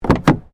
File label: Car Ford Focus Door Rear Open; Ford Focus hire car; Vehicles